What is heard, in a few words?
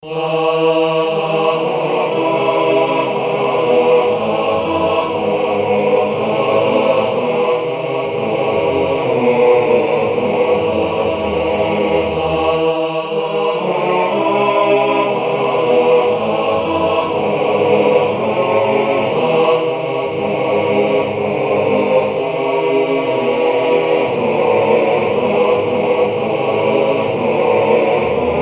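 tenor tenor bass bass, male voice choir; brother james's air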